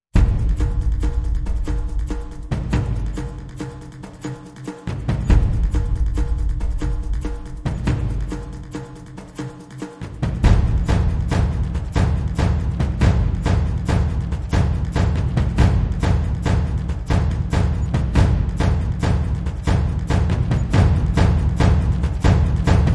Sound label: Perc Only, epic builds and action